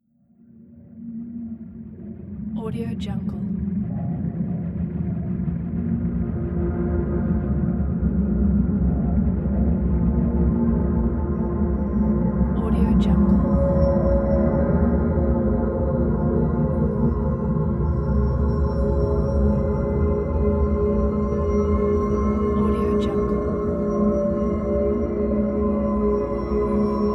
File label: background rotor suspense